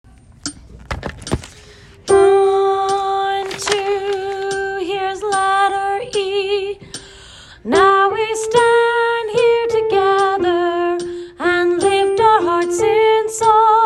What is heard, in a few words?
alto